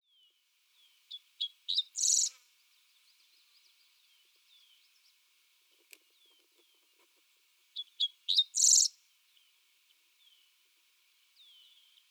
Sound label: Dickcissel